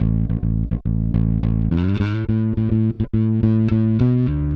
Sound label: Clinical Bass